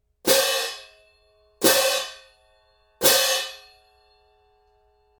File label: 16in a zildjian stadium medium